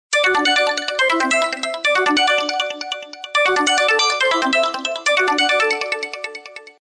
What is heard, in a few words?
Waterdrop Tone; Samsung; GT-S7550 Blue Earth